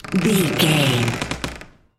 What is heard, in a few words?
door a creak